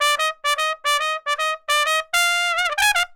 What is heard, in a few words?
trump funk